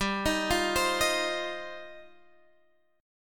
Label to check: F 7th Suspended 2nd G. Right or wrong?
Right